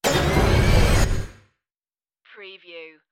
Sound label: starship sliding door